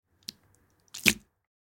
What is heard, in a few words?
Sloppy food squish baked beans; cooking